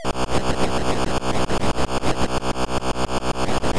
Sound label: Pulsar